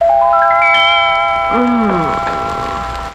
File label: magic wand mmmmmm